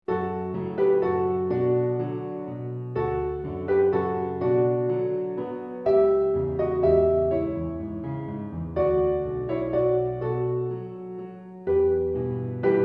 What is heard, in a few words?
Silent Night with Anger Piano Sketch